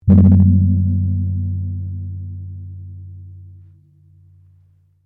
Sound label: GONG NIPPLE INDONESIAN 20 INCH MF, Indonesian gong modern copy; Cymbals; Musical